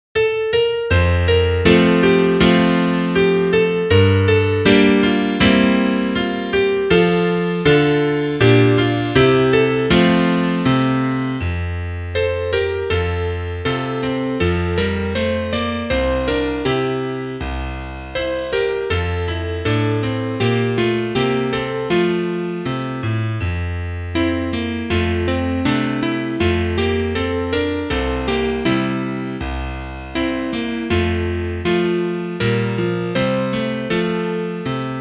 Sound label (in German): Klavier Solo, trompete; Guter Mond, du gehst so stille; (Duett)